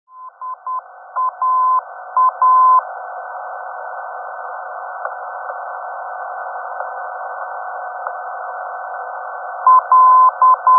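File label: ndb, non-directional beacon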